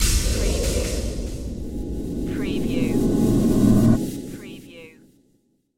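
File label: Production Element Hit Percusive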